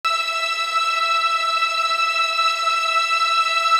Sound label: String 126 Em Dry